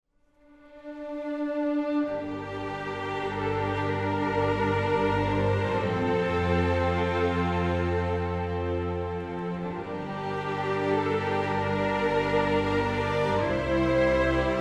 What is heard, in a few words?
Sheep Theme String